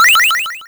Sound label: coin cluster